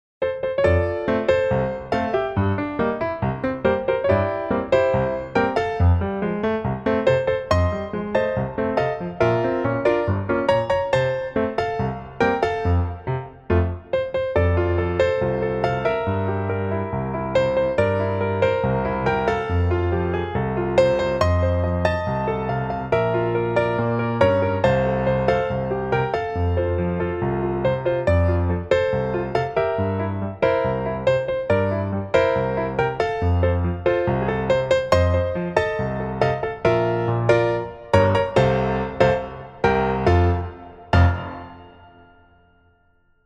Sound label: happy birthday music; Компютърна презентация работа по проект